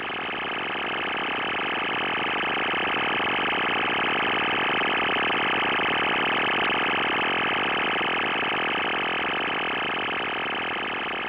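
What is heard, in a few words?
mfsk-7 300 baud; 14401 khz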